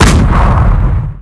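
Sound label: Heavy Tank Cannon Normal Attack; blue archive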